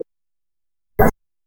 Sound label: modern ui sound scrolling